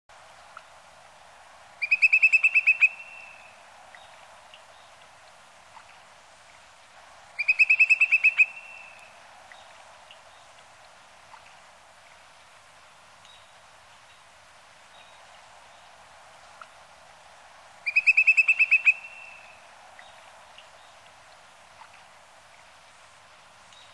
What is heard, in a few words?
Numenius phaeopus; Sakhalin; Kuril; sea; priroda sakhalina i kuril